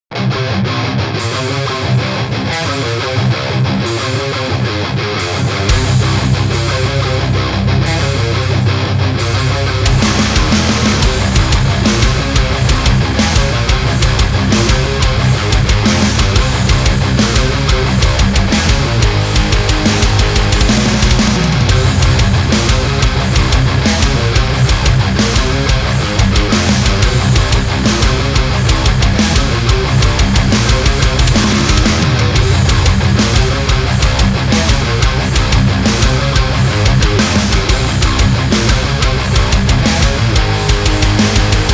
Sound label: Metallic; Ultimate Zombie Defense; Windows